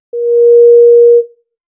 old interphone sound

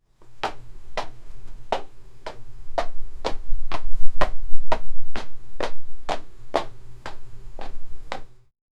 walking, far

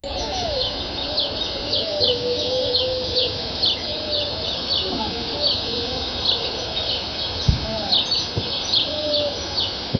background sounds of a, village

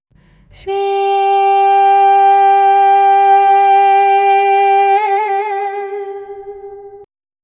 female solo sings soft, hall

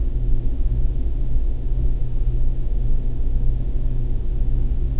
soft digital hum or stati

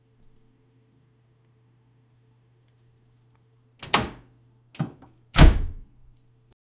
the soft sound of the, latch

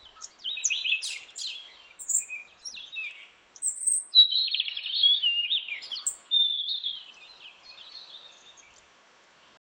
different bird chirping

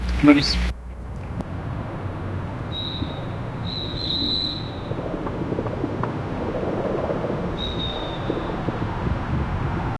distant rumble of city tr

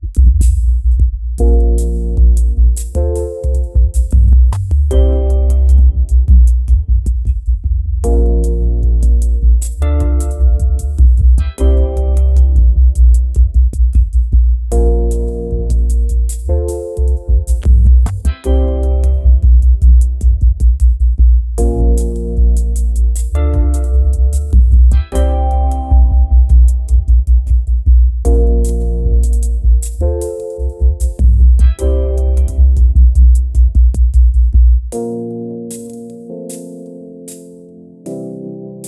a vibrant 57 tempo kpop

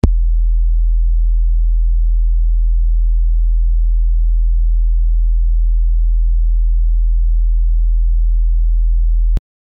deep bass hit

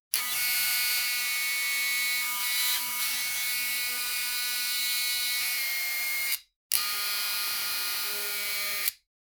repair

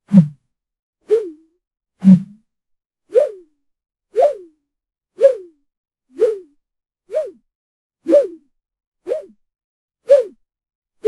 whoosh sounds, air movement

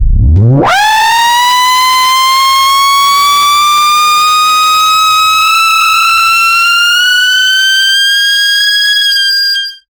bass boosted screaming

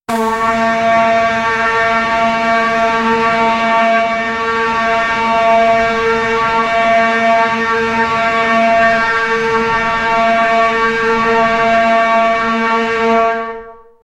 a horn, ceremonial; from the haradrim

